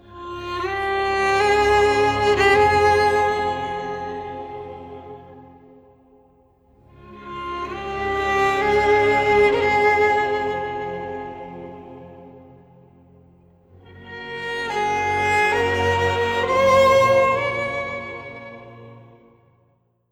epic cinematic

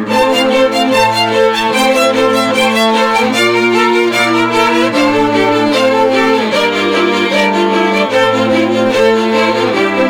orchestral

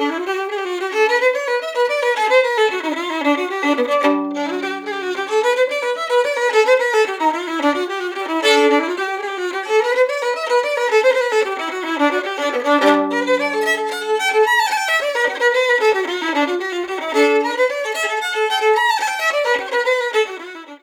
fiddle

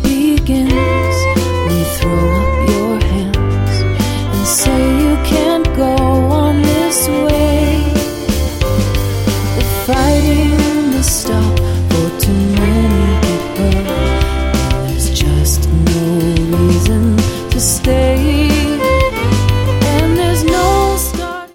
pop

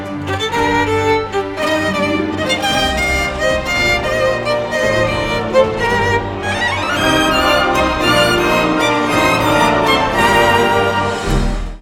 epic cinematic

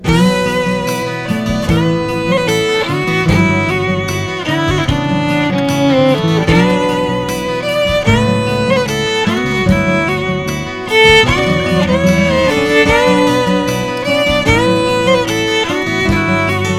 rock

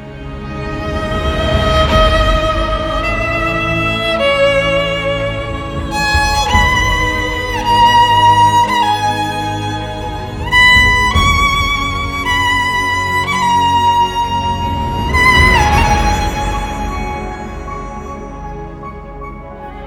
epic cinematic

